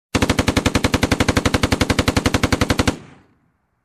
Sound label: Heavy machine gun shooting long